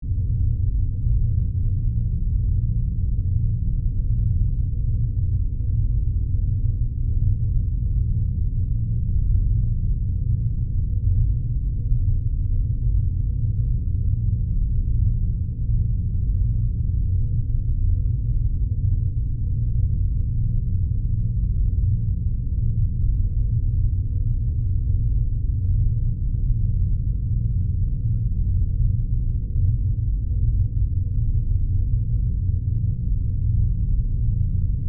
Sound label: Spaceship Hum, Scifi, Spaceship, Hum, Modulation, Science, Fiction; Spacecraft